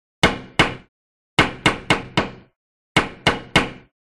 Brass Knocker, knocks

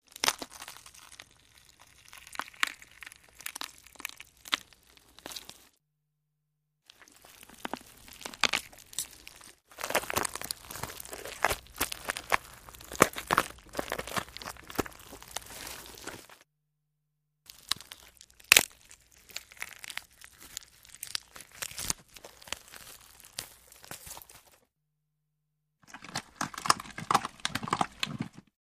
Bone Flesh Sticky, gore; gore stabs & eat

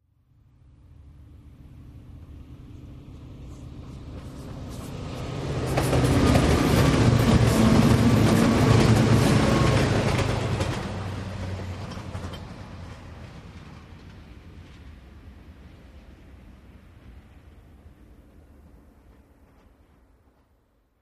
Train Engine By Clos, diesel

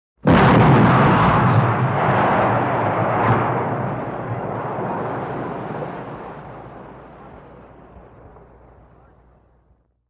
Canon Shot Shell Whir, modern artillery exterior

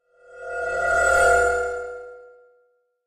Glass Short Glass Ref

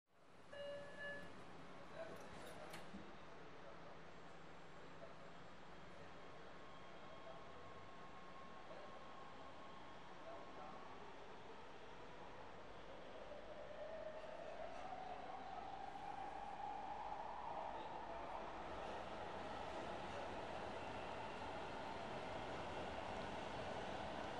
Subway Train Accel

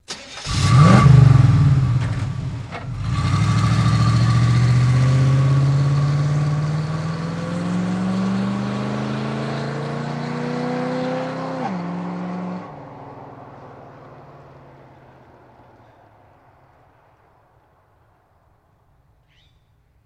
Blazer Strt Out, chevy blazer

